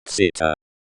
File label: zither